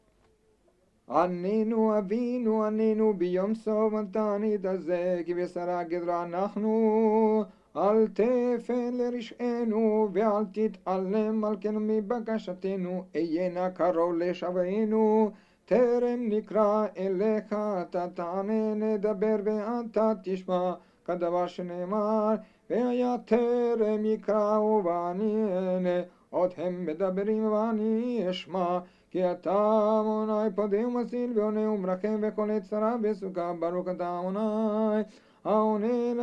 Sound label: Anenu, Sephardi; Fast of Gedaliah Shaharit